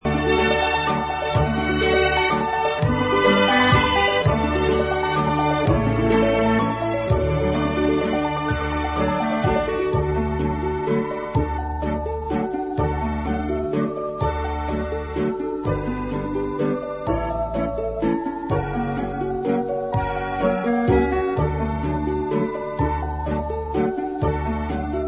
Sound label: Music Little White Boat